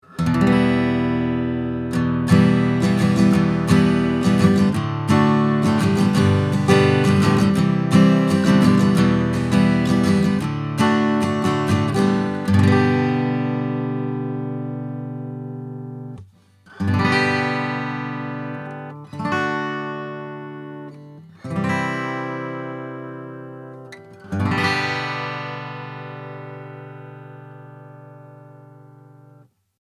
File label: SM58 Acoustic Git; Behringer Mic 2200